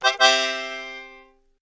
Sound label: Concertina Notification; Samsung S21